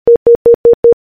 Stutter

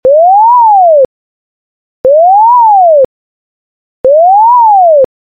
Gated Siren

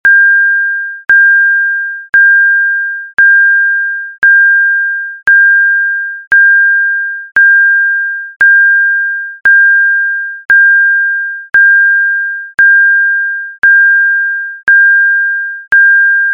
Gong 1600

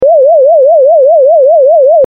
Evacuation

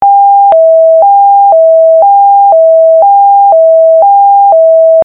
Tone

